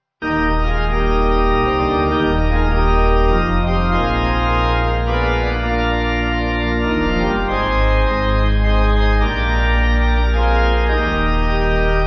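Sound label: Pipe organ, church music backing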